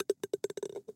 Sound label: in cup; toystory; rex